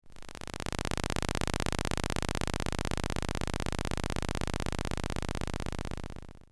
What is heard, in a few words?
Multiple lamps and cables pulsating energy textures